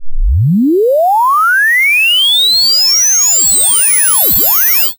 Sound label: sweep nearest, snes resample